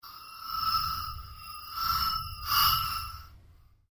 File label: Created Surge, Rise And Dive Sound Effects; sound building blocks created through manipulation of raw material